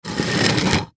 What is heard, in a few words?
Brick Drag